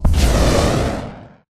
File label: atlas dash close; Titanfall 2